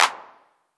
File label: verby hand clap sound G# key; percussion; single hits one shots